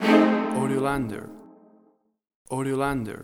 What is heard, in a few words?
A Minor Cello Horror Stab